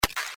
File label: B note